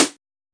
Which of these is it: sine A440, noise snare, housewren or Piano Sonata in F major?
noise snare